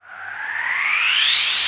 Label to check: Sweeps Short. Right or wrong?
Right